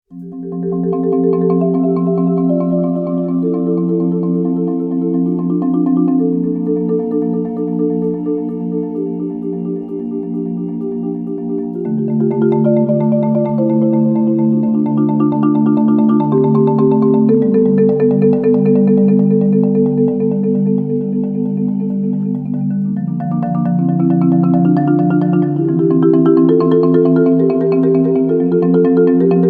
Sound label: Double Drums